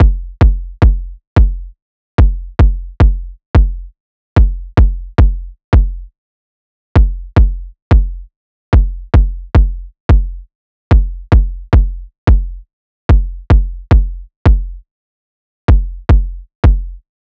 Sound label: loop kick 110; Seismic Loops